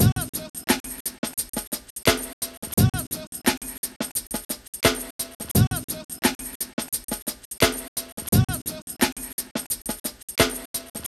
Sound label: intro drum slow 173